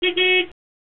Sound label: Horn; TrueCrimeStreetsOfLA; XBOX